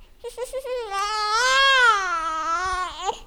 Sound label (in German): Babyschrei, Lamnso